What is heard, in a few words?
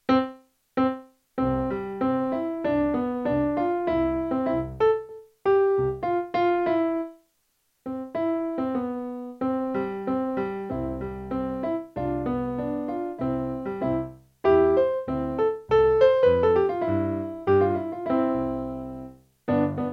Eine Kleine Nacht Musik Accompaniment